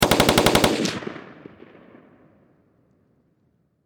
Warfare Machine Gun M16 Long Burst Distant Perspective; Farther Away; Machine Gun Sound Effects